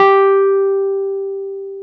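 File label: piano, Wurly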